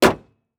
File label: Truck Ford Ranger Close Hood; transportation